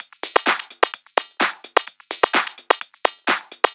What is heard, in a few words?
Loop, 128 BPM